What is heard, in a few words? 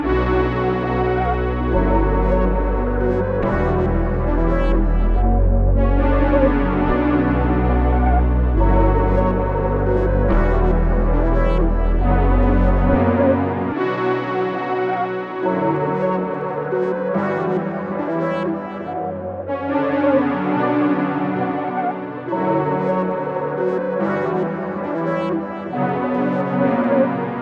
140 BPM B Min; Cymatics